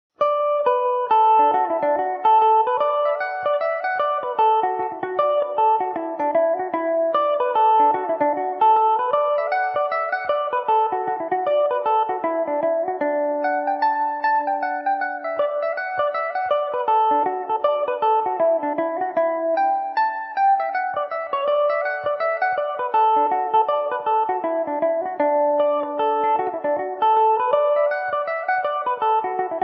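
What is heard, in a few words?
D major revelation, irish mandolin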